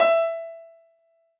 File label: Piano Ff